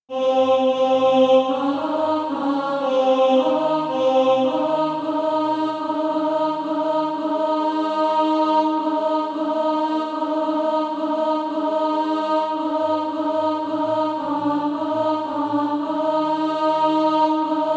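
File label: alto; SATB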